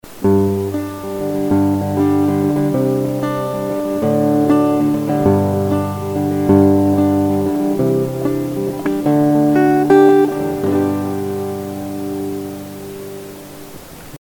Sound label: Acoustic Noise Reduced